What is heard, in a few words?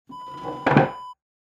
wooden chair set down